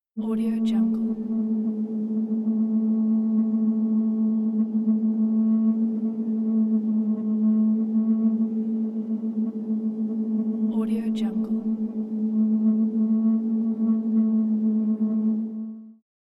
high voltage equipment hum

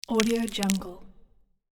food celery twist slow

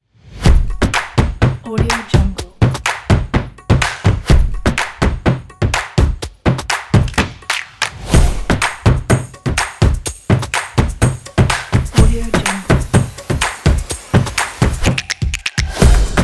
energetic upbeat drums kit

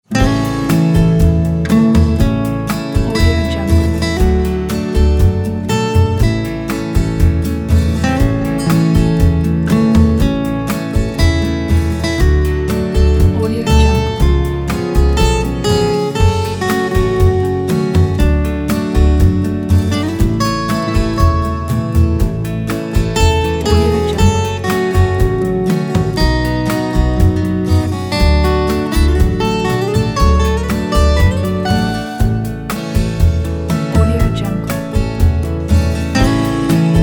summer country evening; wollenweider40

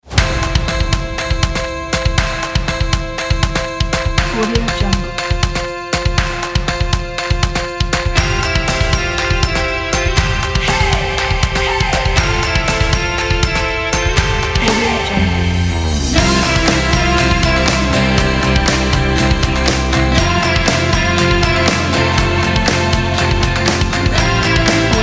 uplifting song

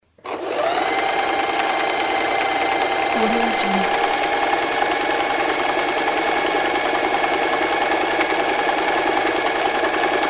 electric sewing machine

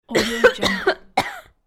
female coughing

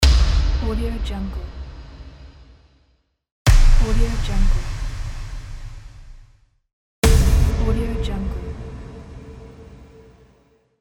boom

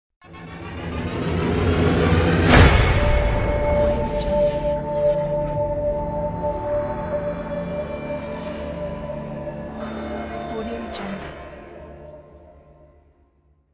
cinematic logo hit trailer

